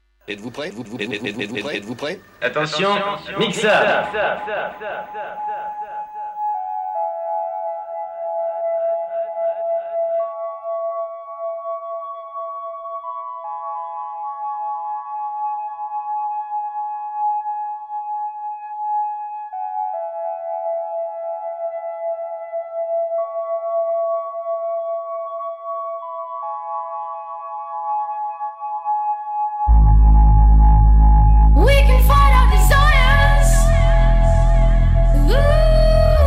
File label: Dubstep; Dubstep 2 Mainstream